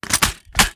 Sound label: reload drum drum in; gmod